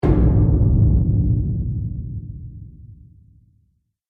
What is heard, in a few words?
Explosion Impact Underground, bomb; Explosion Impact - Underground 1